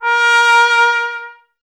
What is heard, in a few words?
BRS TP A#0, Trumpet; Miroslav Vitous